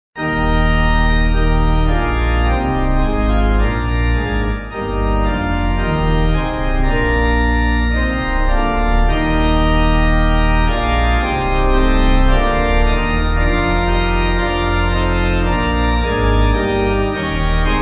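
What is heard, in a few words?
Pipe, pipe organ; Rugby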